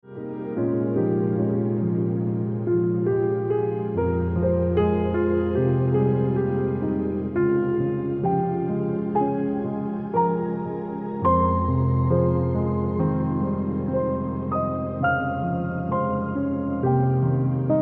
Piano Music

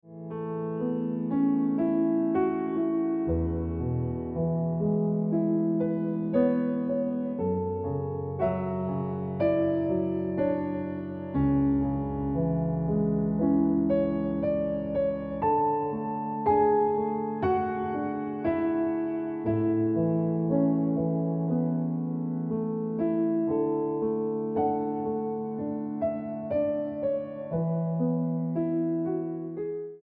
Piano Music

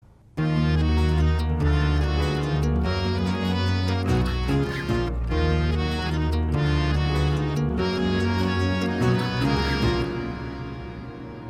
Sad Pakistani Ringtone

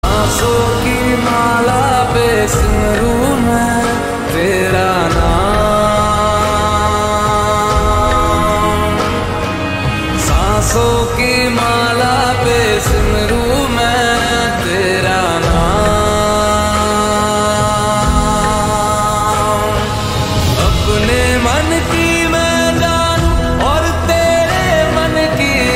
Lofi Slowed Reverb Ringtone

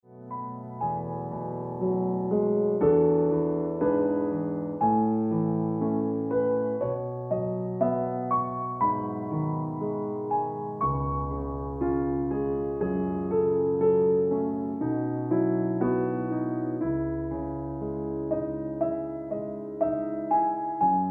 Ringtone Piano Music

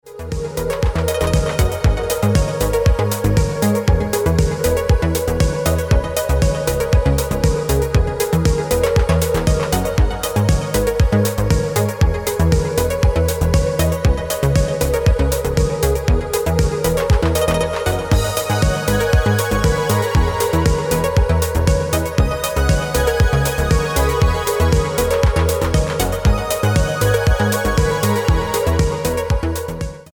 Ringtone

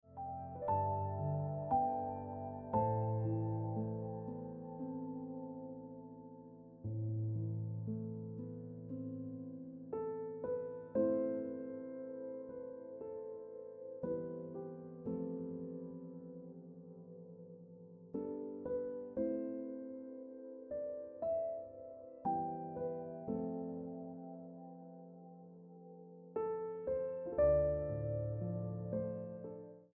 Ringtone Piano Music

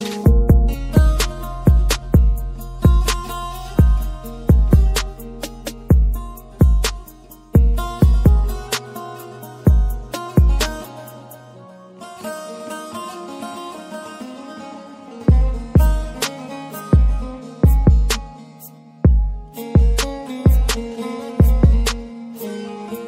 Turkish Saz Ringtone Turkey Ringtone

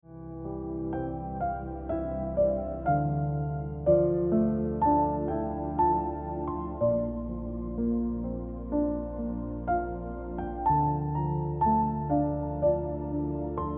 Ringtone Piano Music

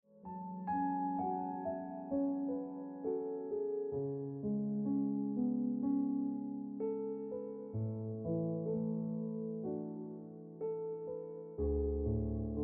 Ringtone Piano Music

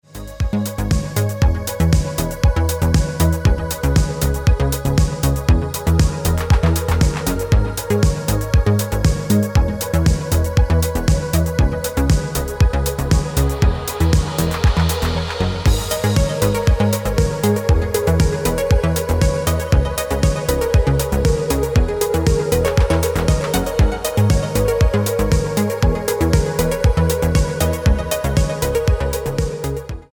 Ringtone

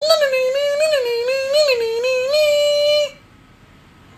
Turkish Tam Ringtone Turkey Ringtone

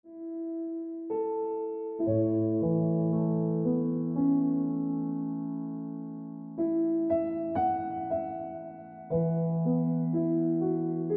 Piano Music

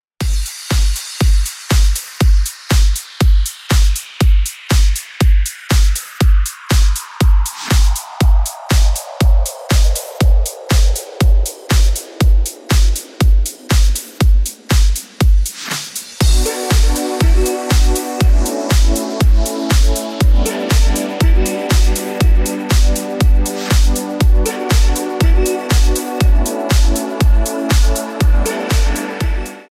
Ringtone

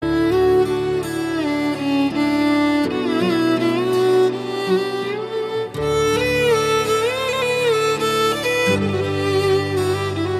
Pakistani Ringtone

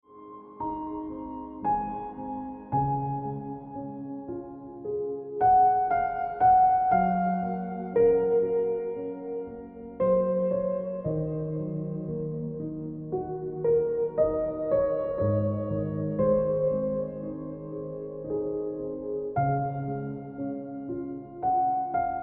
Ringtone Piano Music

